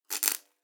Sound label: zap, energy